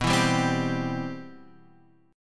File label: G 6th Add 11th B, chord; G6add11/B Chord {X 2 2 0 1 3} strummed; 6add11:1,3,(5),6,11